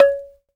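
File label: Bamboo C#3; Shinachiku; CMI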